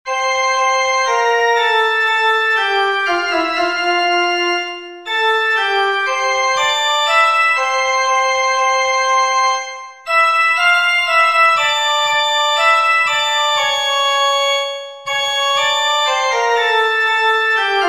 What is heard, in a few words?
Soprano; o fons amoris